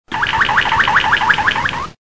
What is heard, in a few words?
woeten waterslager malinois